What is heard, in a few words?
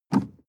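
sports car interior pedal gas step medium; verkehr transport; autos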